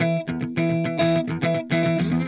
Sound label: Clean Funk Guitar; Sonic Foundry (Sony Creative Software); Indie Rock Anthems; Session 02 - Clinical